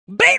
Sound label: diva; vocals and spoken word; cheesy divas